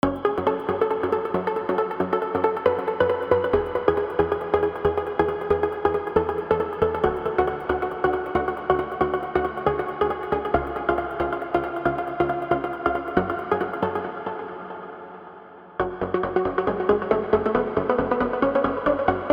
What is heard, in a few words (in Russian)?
мелодии